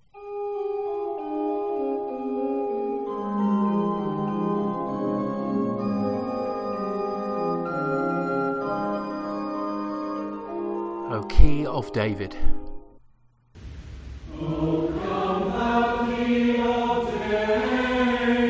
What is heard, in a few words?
advent antiphon; advent antiphon 4- 20th December 2021 - O Clav